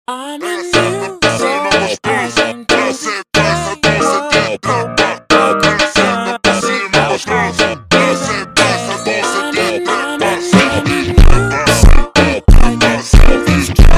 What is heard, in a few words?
Soul Funk over Slowed